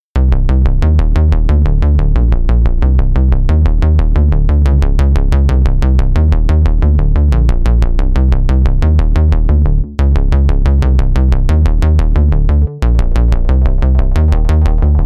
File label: Formant Slap Back